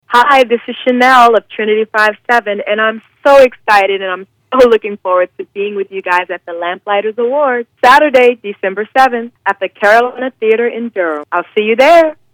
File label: lamp drop